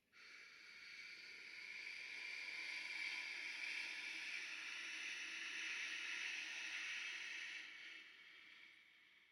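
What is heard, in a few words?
Clarinet Air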